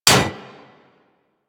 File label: Bullet Impact Generic Enemy Shield; Surface